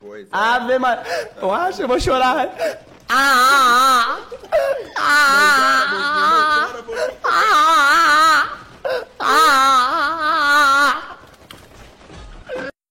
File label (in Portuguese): vou chorar aaaaaaaa